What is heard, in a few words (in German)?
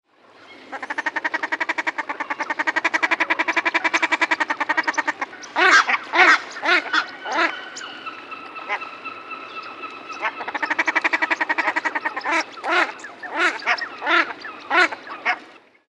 Brandgans Balz